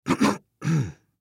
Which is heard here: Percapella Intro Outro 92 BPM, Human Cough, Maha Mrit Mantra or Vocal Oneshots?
Human Cough